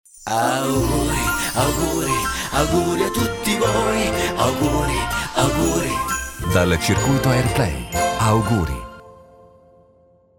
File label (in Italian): jingle natale